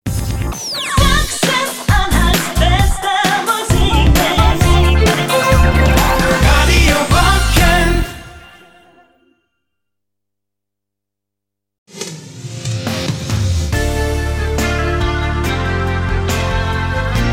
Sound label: jingles; brocken